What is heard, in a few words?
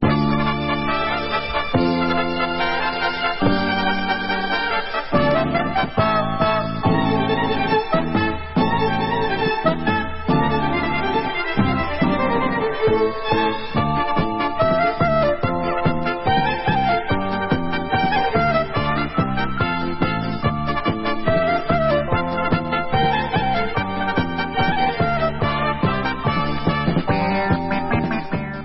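Instrumental, Israeli Dances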